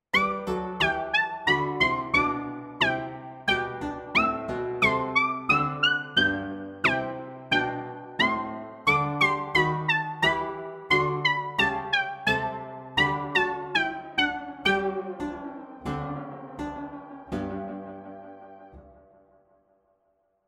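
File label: Contrapuntal, Pianoteq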